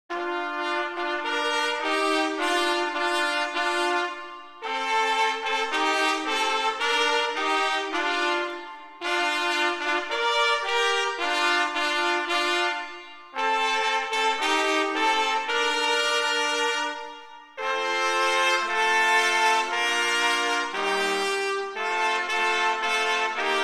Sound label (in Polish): hejnal; twardogory